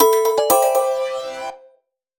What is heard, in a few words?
payment sent; Taler Wallet